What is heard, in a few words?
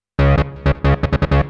legend, 160bpm, bass, hous-tec